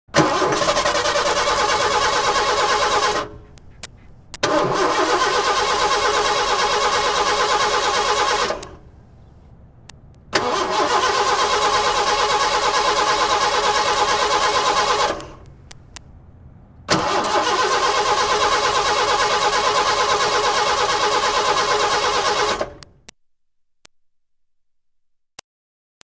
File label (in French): Voiture qui demarre pas